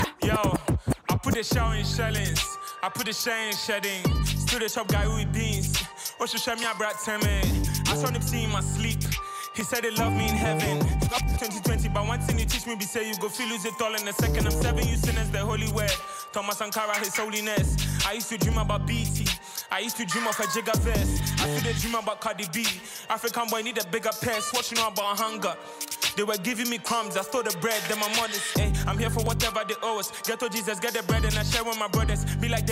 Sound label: Hip Hop Cypher Freestyle; BET Hip Hop Cypher 2020